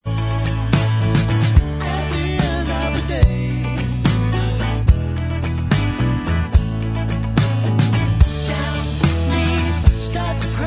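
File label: backing vocals Track, backing tracks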